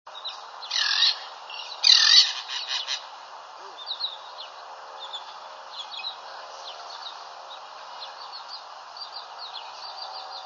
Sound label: tern royal